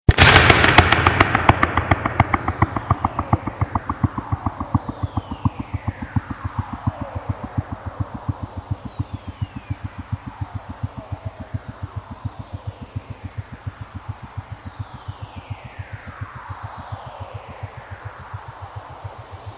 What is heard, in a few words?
Flutter Echo 20dB